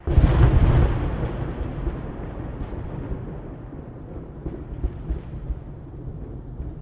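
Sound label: Thunder Crack Rumble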